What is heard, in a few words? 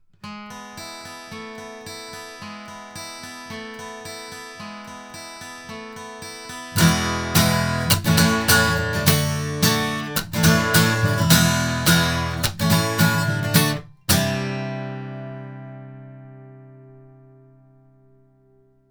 Avant CV-12 tube 8 guitar